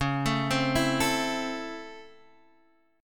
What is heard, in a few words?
Db Minor Major 7th Sharp 5th Db down Guitar Open G